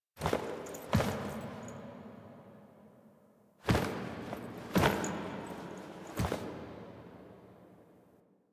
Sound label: Foley C; Warframe; Quests; The New War; Sealab; Hunhows Lair; Drifter